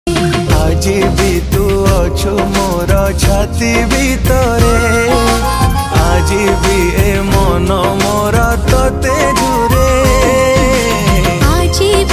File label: odia sad song ringtone romantic sad ringtone